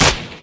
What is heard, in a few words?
smg, Gunshots; Weapons